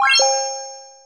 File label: GAME START